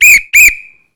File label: police Whistle; whistles Horns Bells